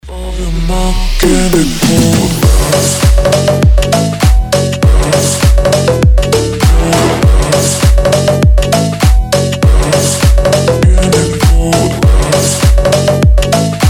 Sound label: phonk